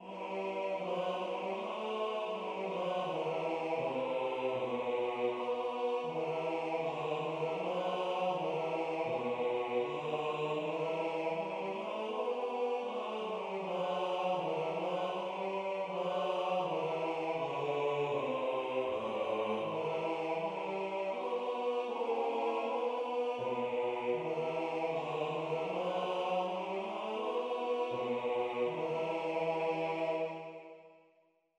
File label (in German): Bass; Anneli, wo bisch gester gsi